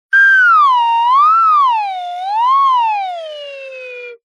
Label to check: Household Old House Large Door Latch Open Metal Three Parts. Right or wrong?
Wrong